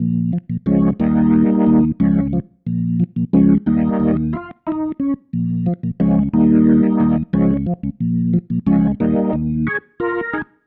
Pattern, Organ

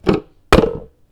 fooley; M8; percussion and foley

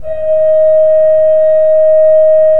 KOPPELFLUTE D4, Cathedral Organ; Propeller Island

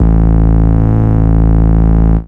sub A, techno trance bass; Techno Trance Essentials

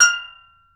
F5 TENOR, STEEL DRUMS; AKAI S6000 CD-ROM; WorldSounds

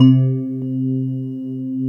CRYSTALS

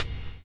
LO FI HH; LO-FI KIT; USB Soundscan vol.11; AKAI

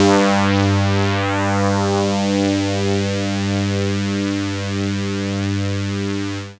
PHAZE, SYNTH; AMG; Global Trance Mission VOL-2

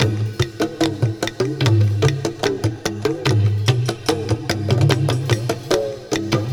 Rubber Drums, 147, Percussion Combos; M-Audio; Pro Sessions VOL-38; Abstract World Fusion 2

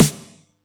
Yamaha EX-5

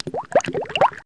WATER DRIP, SYNTH FX; AMG - Vince Clarke Lucky Bastard

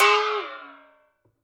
BOX FX; Univers Sons - Raricussions

